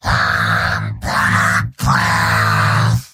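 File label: yell, robot; heavy; mvm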